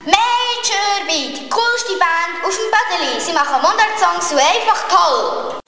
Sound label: Jingle Major B; Unterseen